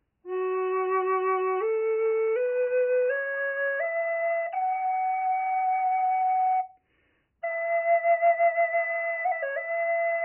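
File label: natural, flute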